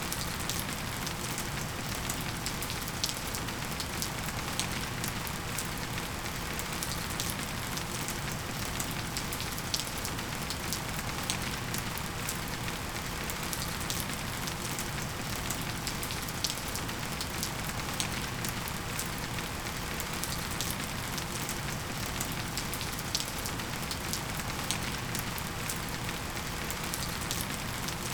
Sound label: Rain Sounds Rain